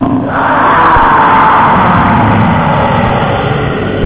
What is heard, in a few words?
groan; spacehulk